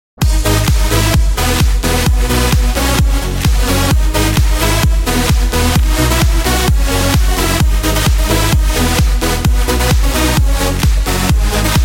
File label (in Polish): klubowe zm